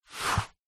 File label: foley shoe ballet foot slide in